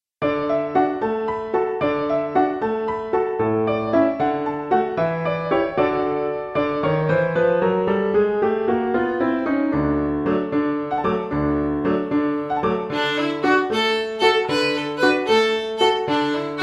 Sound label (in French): Giga Gigue Violon et Piano